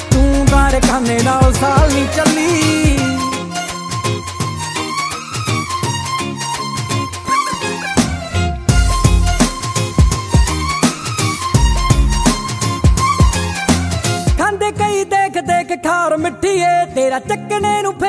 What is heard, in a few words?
Ringtone Punjabi